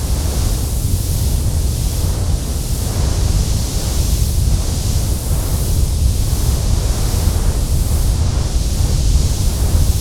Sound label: dune wind